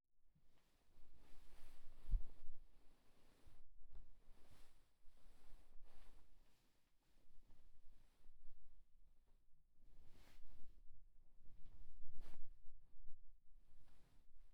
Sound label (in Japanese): 布団 を もぞもぞ; KU100; 布団の音